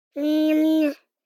BABIES TALK Boy 12 months Neyaneeya; Baby Boom

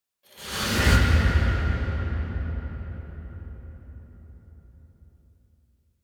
Impact Distant Deep Reverberant Breath; Hollywood Edge; sound designer's toolkit v2

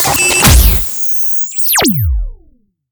Scanner Shut Down; Plastic Surgery

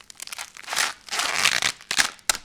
Tear Corn Husk; SFX Kit

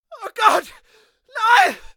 npc male scared; DeadFright

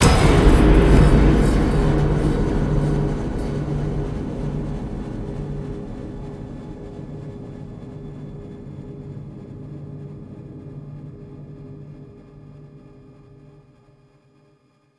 STING, Cinematic Metal Impacts; someones home; boom library

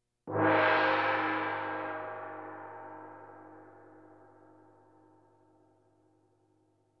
Percussion Bongos Tympani rolls Gongs Drums; Valentino Production Sound Effects Library CD50

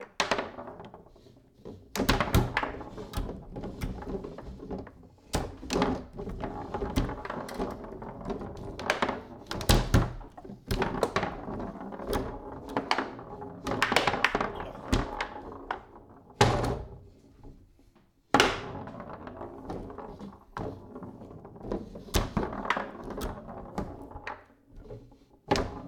Foosball Play

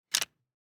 DICE on Felt, Grab, Standard, 5 Five Dice; Samples; ArticulatedSounds; DICE Sfx Party (Extended)

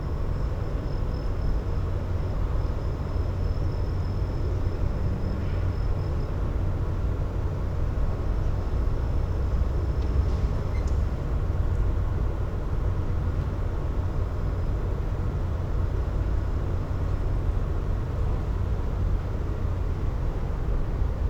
Urban din day traffic reverberating; urban and suburban; boom library

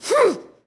Boxing Female Punch Breath

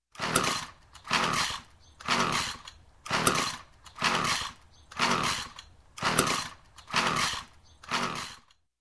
Chainsaw Will Not Start, Nine Pulls, Logging; Sound Ideas 2000 Series

